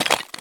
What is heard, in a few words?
run tile; Escape from Tarkov